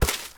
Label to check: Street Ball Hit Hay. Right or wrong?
Right